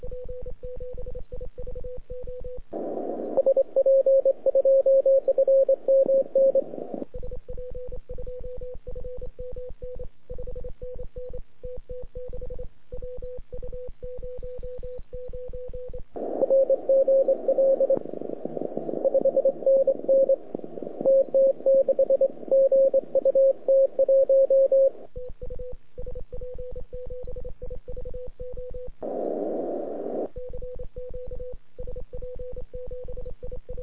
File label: CW 3,5MHz; SP2FMN de SP7IVO; euday 2017